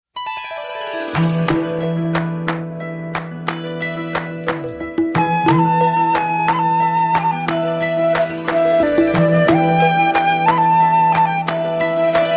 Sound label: Music Bit